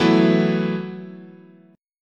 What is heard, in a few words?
E Suspended 2nd Suspended 4th, chord